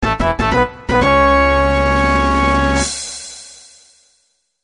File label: quiz fanfa